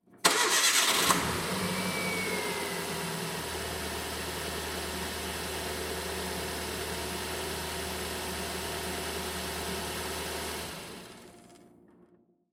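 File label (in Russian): звук от ford fiesta при включении и выключении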